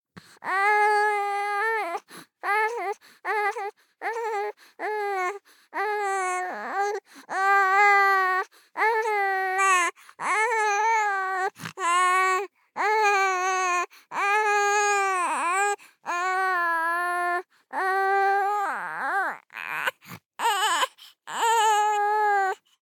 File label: BABIES BABBLE Boy 3 months Stressed; Baby Boom